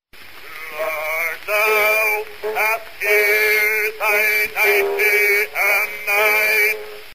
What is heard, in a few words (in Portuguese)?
hinos de sankey